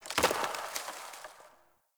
wetland forest day branch break; Ambience

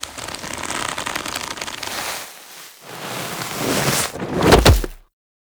Hand Slip Grab Foley; Tenno; Operator; Warframe; The New War; Throne Room

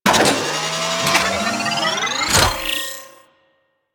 Crp Ship Rolo Dex Open A; Ambience; Props; Warframe; Corpus Ship Remaster

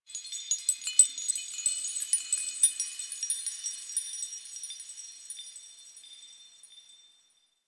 High Texture, Ambience; Warframe; Quests; TheNewWar; Zariman

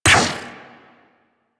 Drone Laser Fire; Artificer Drone; Super Moa Drone

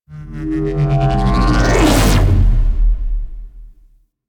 Laser Passby; Warframe; Bard Quest; Bard Boss Fight

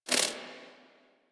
Screen Glitch; Player; Warframe; Mini Games; Caliber Chicks Minigame

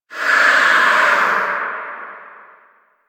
Vocal Pain B, Enemies; Warframe; Priest Quest; Rell Specter

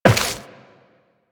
Step Metal, Footsteps Walk; DE; Warframe; Tenno

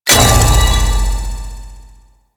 Pre Death Start

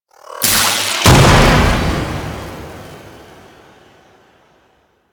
Radial Blind; Warframe; Alad V; Abilities

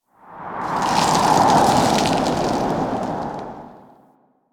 Tower Rustling Leaves; Ambience; Warframe; Quests; The New War; Cetus; Unum Tower

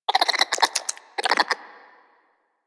Vocal Idle, Hunting Critters; Warframe; Cryptilex

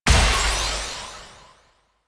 Alchemist Vial Ability Burst; Warframe; DE; Warframes

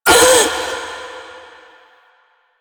Ring Fail; Warframe; Jade Shadows; Quests; Birth Minigame

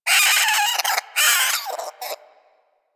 Vocal Death, Hunting Critters; Warframe; Cryptilex

